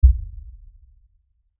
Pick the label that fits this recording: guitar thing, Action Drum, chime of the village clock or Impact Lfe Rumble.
Impact Lfe Rumble